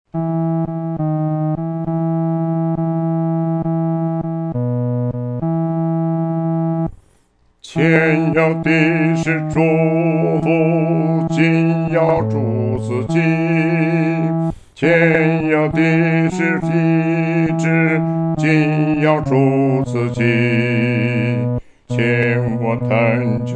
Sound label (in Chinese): 独唱 第四声